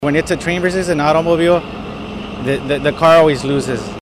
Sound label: crash; expo line crashes into car on exposition